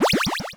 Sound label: bonus